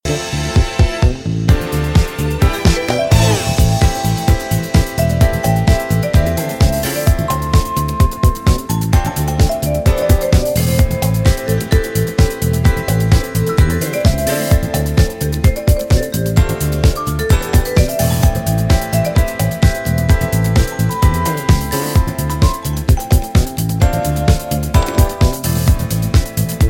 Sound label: saturday instrumental